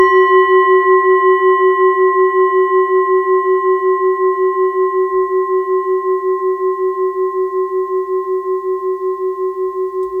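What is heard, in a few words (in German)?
Klangschale Tibet Kulmination; Planetenschalen; Mondtoene; Klangproben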